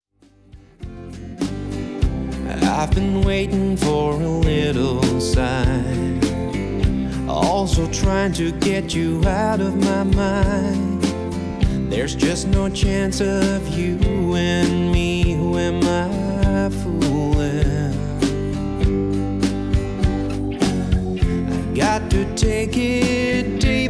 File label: singing without grooving